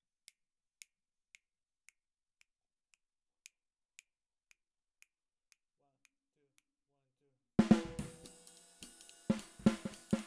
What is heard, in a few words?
SNR